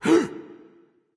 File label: nv breath, vox; smite; voicepack; vulcan